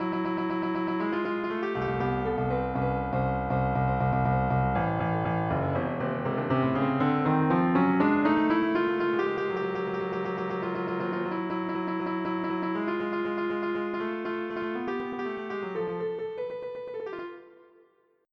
Lorenz data midi; Symbolic Composer 4.2

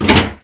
locker; phoenix-end

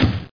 KICK A11; CD Action

